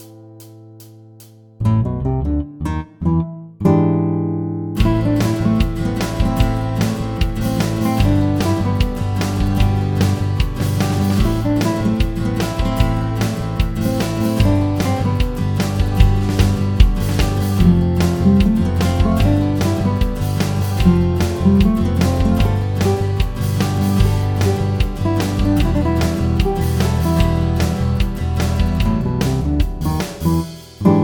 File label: With Guitar Org Tempo